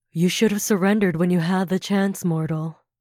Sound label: Death; Junko; Phantastical Boundaries